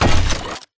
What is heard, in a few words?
footstep shuffle; Titanfall 2